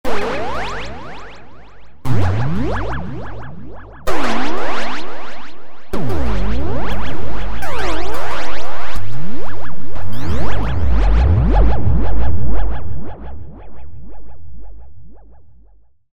SYNTH BASICS Laser Shot; Apple GarageBand 2

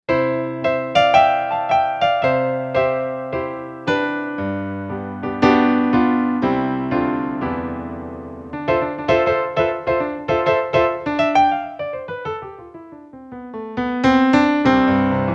Piano 8', Korg M1Rex; Factory Patches

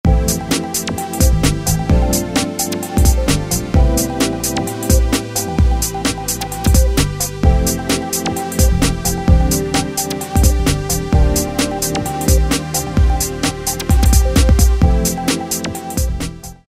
2Step, Roland D2

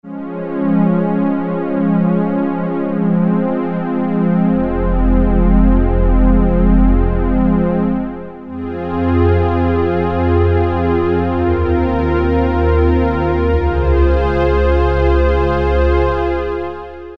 Low Dark Strings, Roland Juno-106; Factory Preset

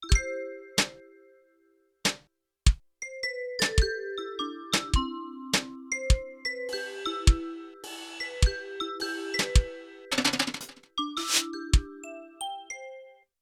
Drums-Vibes Split; Yamaha TX16W; Jazz Performance; Jazz Performance 2

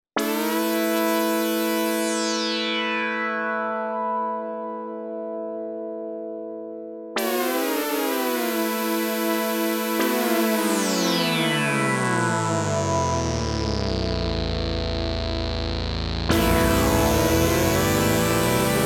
Portamento, Oberheim OB-8; Emerson Portamento

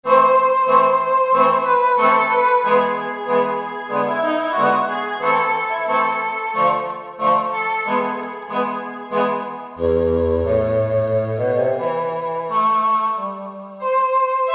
Vocoder

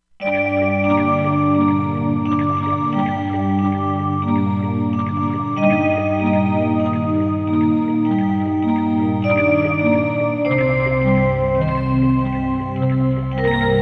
Wind Bell; Environment

